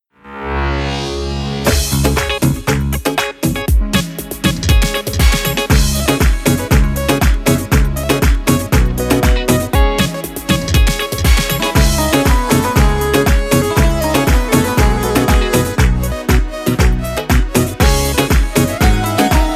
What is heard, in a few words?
Afghan Music